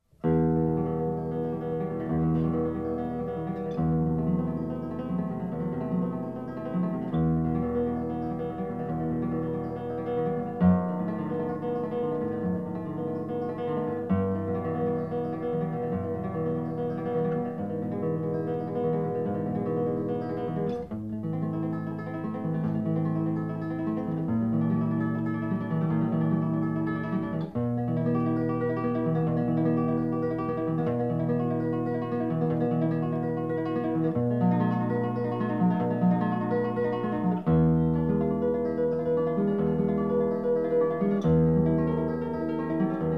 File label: Guitar Etude in E minor; Classical Guitar